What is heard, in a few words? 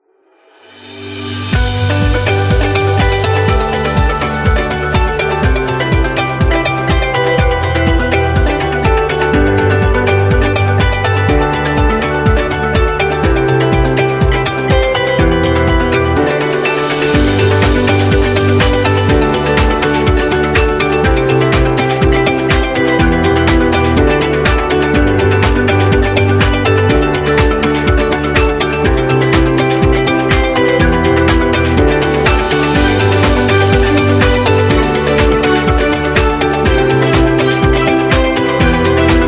Corporate Background